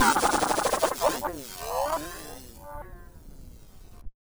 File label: Malfunction Synth, malfunctioning synth samples